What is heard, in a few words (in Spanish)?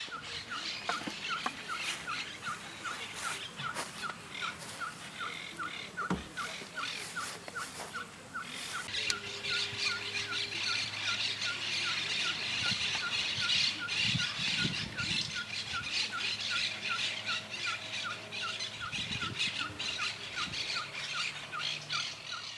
Caburé Chico voz, aves